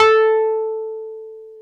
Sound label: Wurly, Keyboards of The 60's and 70's